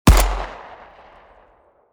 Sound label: revolver shot; weapons; grubs